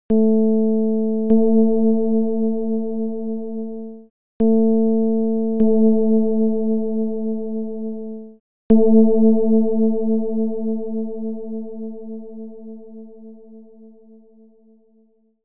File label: csound foscil 220hz